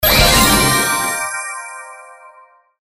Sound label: shield